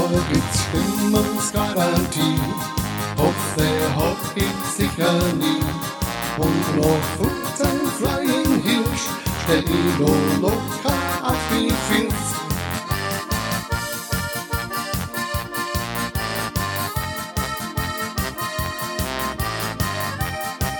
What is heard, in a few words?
Polka